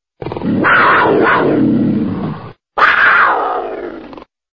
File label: wildcat growl